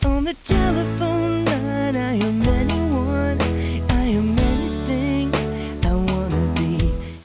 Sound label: Ring In